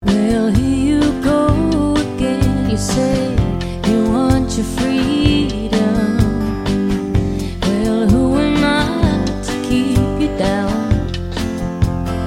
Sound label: acoustic